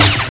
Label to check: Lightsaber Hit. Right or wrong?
Right